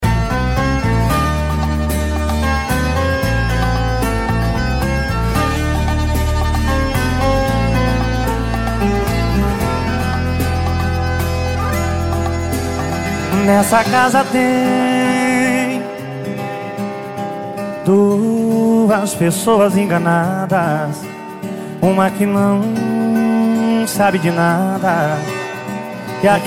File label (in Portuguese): esp funk pisadinha sertanejo